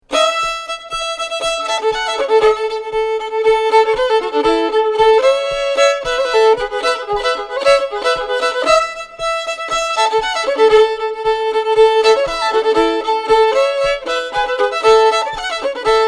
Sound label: Bowing the Strings; From The Ottawa Valley, Don Messer and many other champions of the Old-Time style